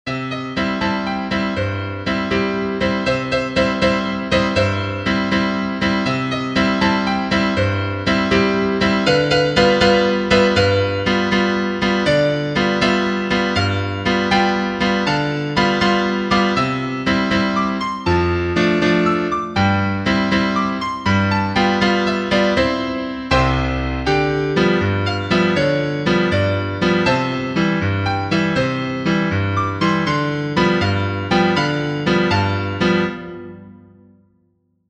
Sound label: PIANO